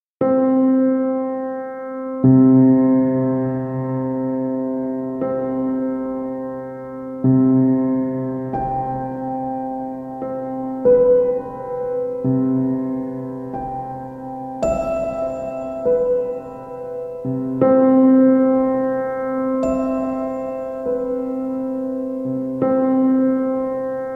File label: Piano Sounds